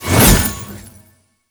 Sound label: Sword Attack Whoosh; Weapons; Melee; Warframe; Orokin Weave Sword; Weave Boss